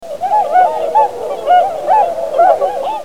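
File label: Bewick's swan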